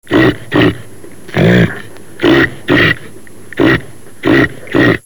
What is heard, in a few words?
woodland caribou